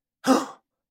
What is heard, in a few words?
man gasp